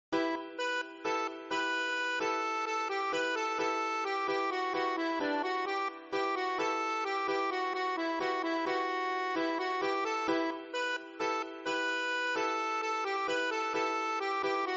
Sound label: Sandansko Horo slow, balkan